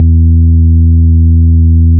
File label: sine 82Hz